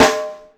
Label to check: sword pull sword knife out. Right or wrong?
Wrong